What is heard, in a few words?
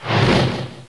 flame